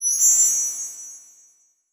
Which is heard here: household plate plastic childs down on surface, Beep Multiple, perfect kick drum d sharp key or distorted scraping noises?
Beep Multiple